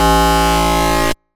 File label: wrong ladder; Example scripts for the SAU language, and audio files rendered from them using the saugns